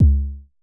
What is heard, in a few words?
Basic Kick C#2, Dirty Kicks, Vermona Kick Lancet; LookIMadeAThing Kicks